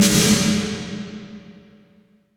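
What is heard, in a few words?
SD PLATE, reverb; SPX900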